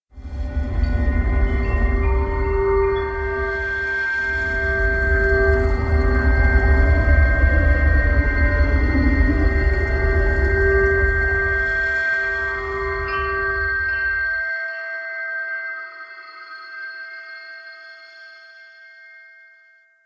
Tinnitus; SyrinScape; Iris hill manor

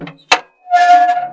door; Morrowind

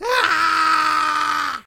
Goblin Scream; SyrinScape

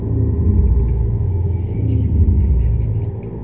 heart; The Elder Scrolls III - Morrowind

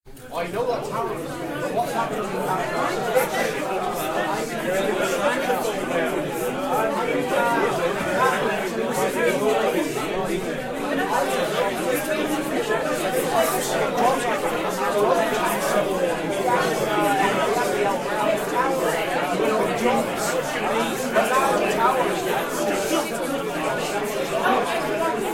Concerned Crowd, library; Dragon's Demand; SyrinScape; Madness in the library

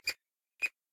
Rat Squeak; SyrinScape

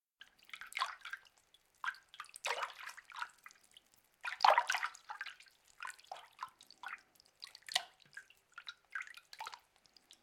Splooshing around in the water; SyrinScape

